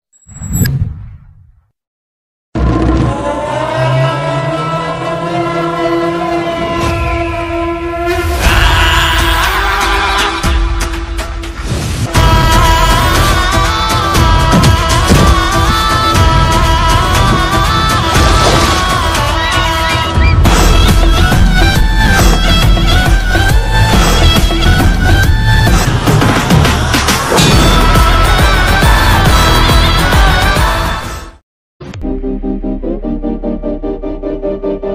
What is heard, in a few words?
telugu bgm ringtone; ooru peru bhairavakona